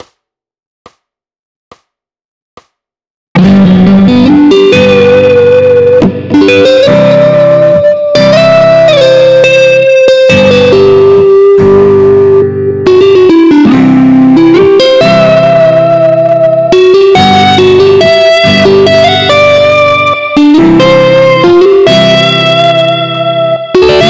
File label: Solo, Key of G